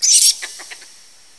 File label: rat; bayeux